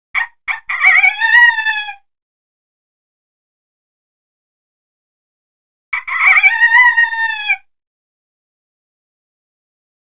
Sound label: Coyote Challenge Mature